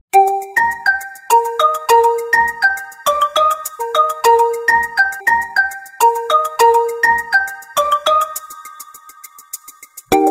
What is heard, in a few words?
ringtone